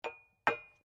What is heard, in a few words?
SLEDGEHAMMER METAL IMPACT LIGHT; Working with a sledgehammer; Hammers; Tools